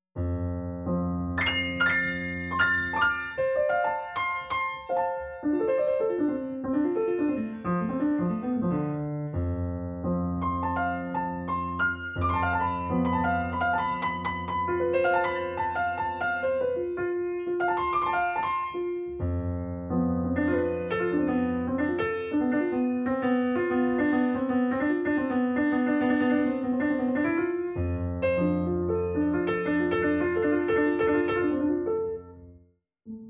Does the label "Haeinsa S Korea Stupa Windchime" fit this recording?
No